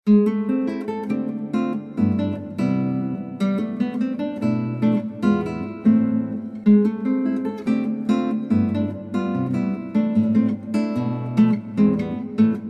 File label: Samba